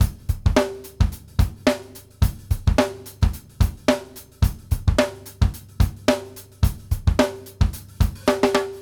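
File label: basic, latin, drums; Sampleheads; New York City Drumworks VOL-1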